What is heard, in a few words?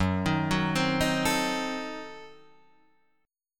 F sharp Diminished 7th F sharp Guitar Drop D; 4,6,4,5,4,5